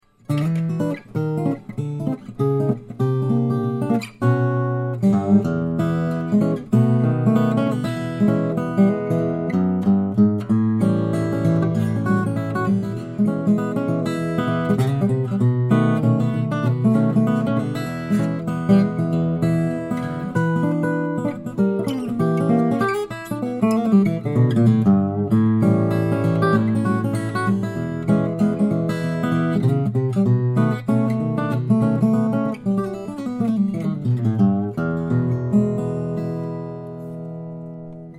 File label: tippin staccato, steel-string flattop guitar